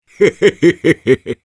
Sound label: laugh happy, ru; Sniper; (Короткий смешок 1)